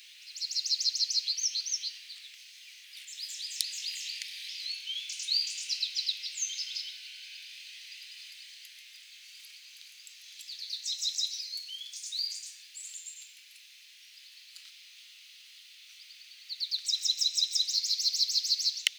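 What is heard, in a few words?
American goldfinch spinus tristis, birdsong; peniya ptits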